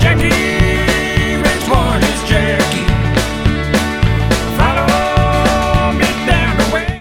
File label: Country Song